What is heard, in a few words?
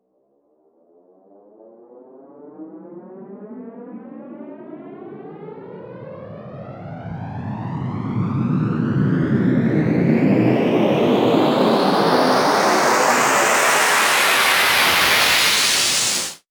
FX Uplifter